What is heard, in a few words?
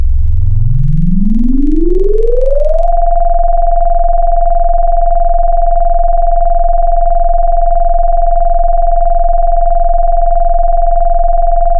harsh harm